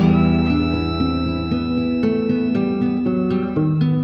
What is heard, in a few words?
guitar, Sad